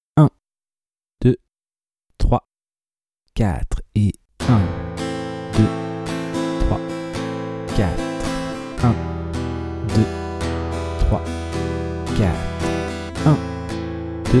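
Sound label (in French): rapide La rythmique rapide avec la première grille, suites d'accords; De 0 à vos premières suites d'accords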